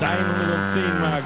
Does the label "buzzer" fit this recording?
Yes